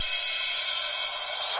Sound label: 909 bright crash rev, DRUMS (SINGLE HITS), Crashes